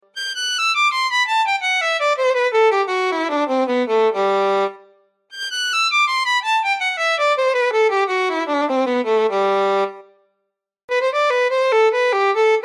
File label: Scales & Banjo Duel